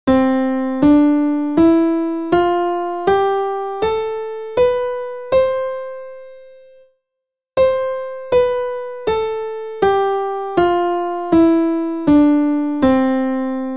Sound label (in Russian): primery zvuchaniya naturalnyh mazhornoy i minornoy gamm